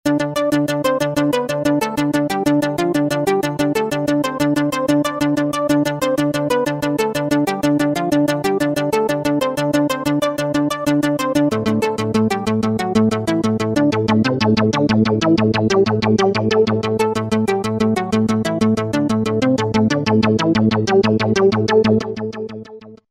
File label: Roland V-Synth